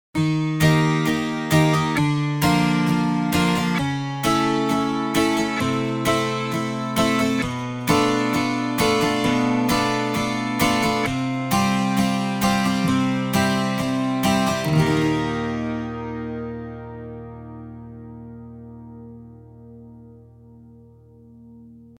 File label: Strummed Acoustic 12 String